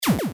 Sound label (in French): tir mechant; spaceinvaders